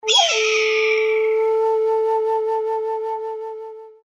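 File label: outro sound effects; Dreamcatcher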